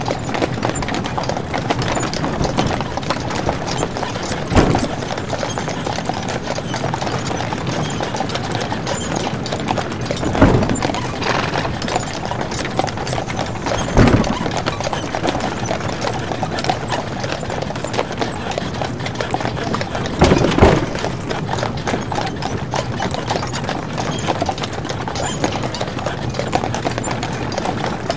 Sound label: Carriage on Stone Road - Loop; Sample Audio Pack; Free Pack